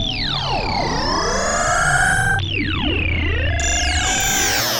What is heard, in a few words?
Fireworks Samba; Sonic Foundry (Sony Creative Software); Ma Ja Le Ethereal Textures